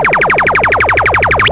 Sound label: laser